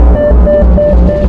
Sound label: bleeps; SoundFactory; FX